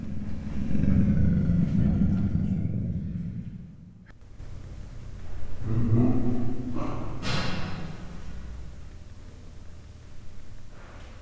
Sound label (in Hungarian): három hónapos kölyök csipogása, leopárd; miskolci zoo 2009